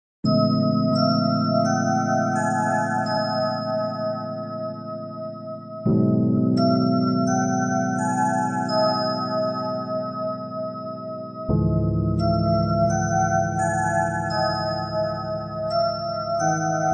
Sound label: instrumental slowed reverb